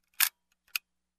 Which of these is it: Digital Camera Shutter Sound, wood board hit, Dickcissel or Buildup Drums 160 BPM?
Digital Camera Shutter Sound